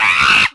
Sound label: monkey